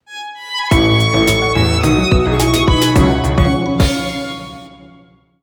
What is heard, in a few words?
Enemy Defeat - Jingle; Touhou Lost Branch of Legend